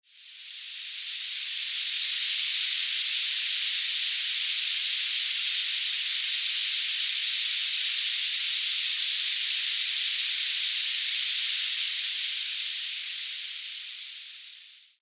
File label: 5000 Hz to 10 kHz Masking Noise; Tinnitus; Tinnitus Extinguisher